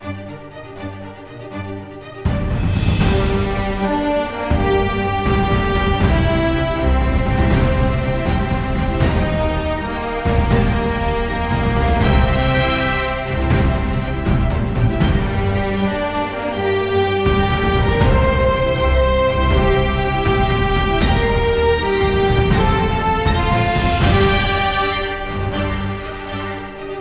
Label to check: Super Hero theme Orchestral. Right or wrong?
Right